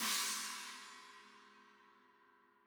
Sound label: China, cymbal; ZKCC 17; apulSoft apTrigga2; Multi-Velocity Cymbal Samples